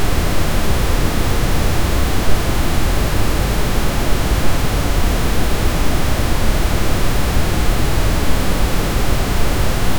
pink noise

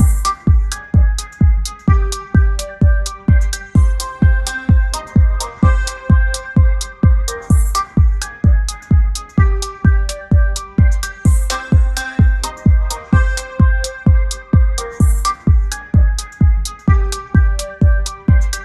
bar club music far melody

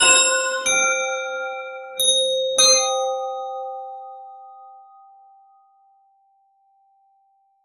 epic ring bell

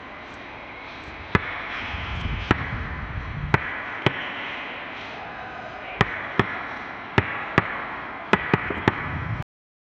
hollow metallic clang of, stadium

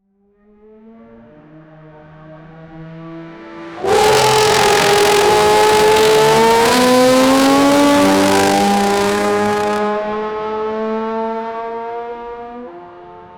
2 strokes engine 50cc, motorcycles

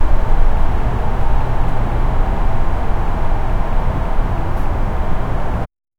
corridor ambience

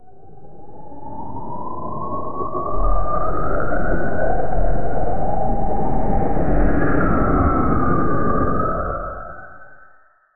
ceratosaurus roaring; dinosaurs

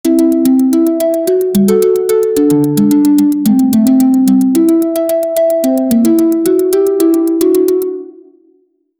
song jingle, brand

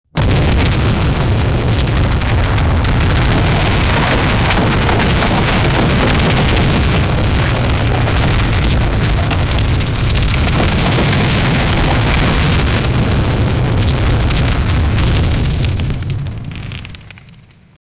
beam fire sound effects; warping

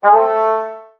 a combination sad trombon, wah wah

